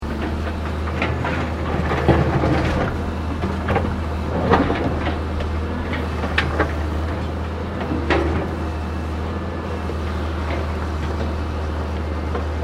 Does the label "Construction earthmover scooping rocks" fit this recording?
Yes